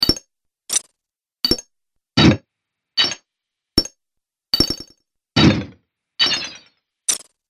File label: Robotic Menu Click Collection